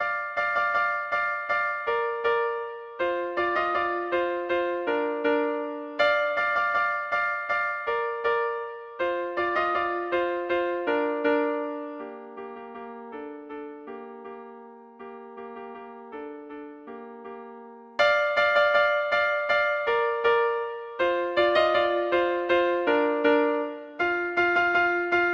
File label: duos marcia